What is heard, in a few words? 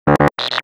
miss